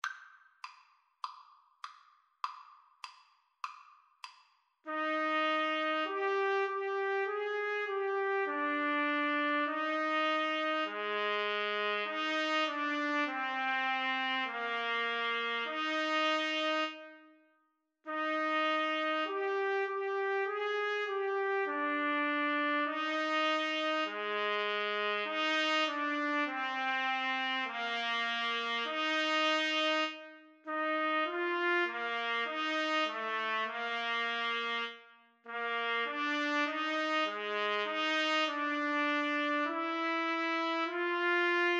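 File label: lo how a rose trumpet duet karaoke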